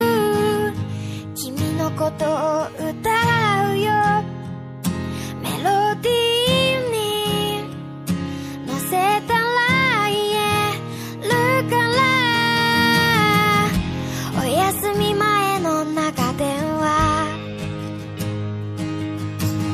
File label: japanese ringtone